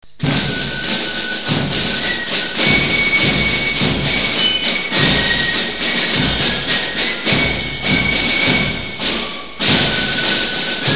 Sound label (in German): Torgauer Marsch